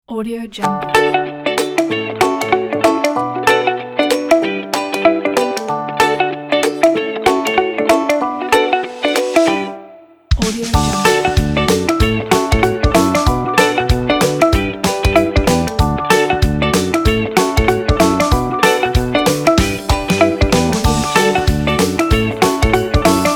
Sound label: happiness kids music